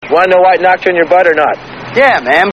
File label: knock butt